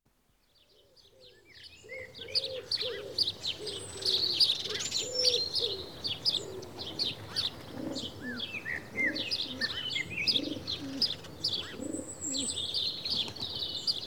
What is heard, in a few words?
House Sparrow (Passer Domesticus); Birds; The BBC Sound Effects Library